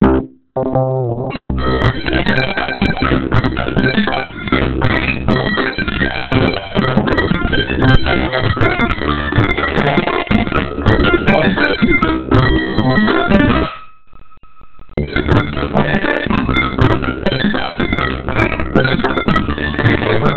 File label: nokia ringtone arabic meme